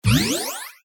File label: collect